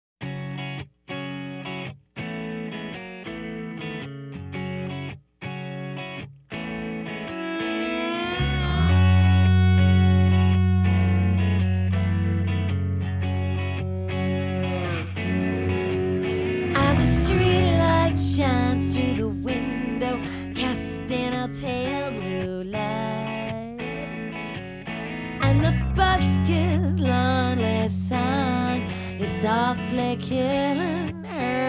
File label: Emotional Rain